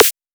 little snare

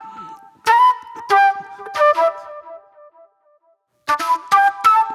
Sharp Delay Flute

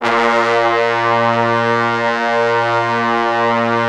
A#1, Orchestral Brass; Best Service ProSamples vol.20; AKAI

